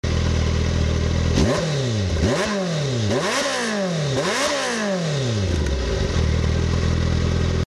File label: gtr 1400, kawasaki